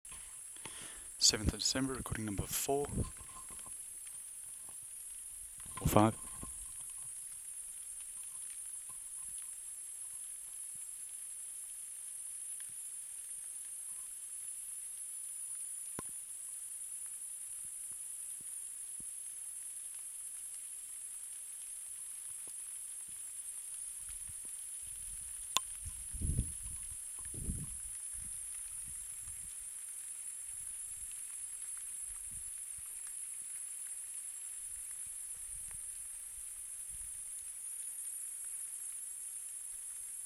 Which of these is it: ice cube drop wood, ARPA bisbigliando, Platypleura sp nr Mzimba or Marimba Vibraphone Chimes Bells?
Platypleura sp nr Mzimba